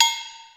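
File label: Agogo, Yamaha SY22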